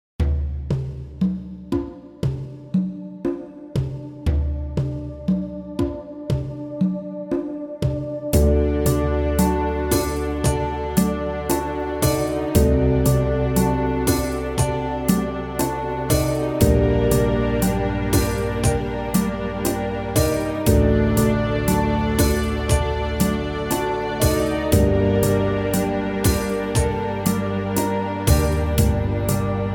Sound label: modern piano minus